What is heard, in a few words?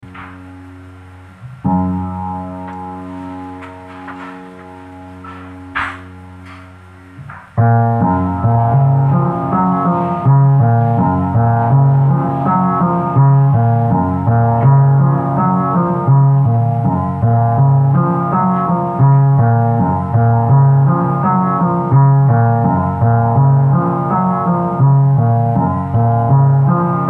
piano